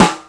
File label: snare